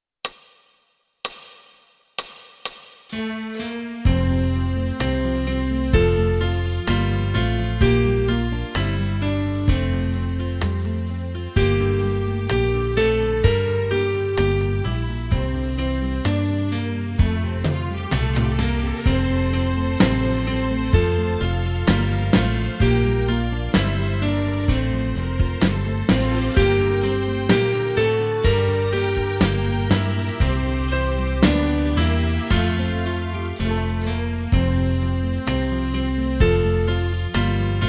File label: Piano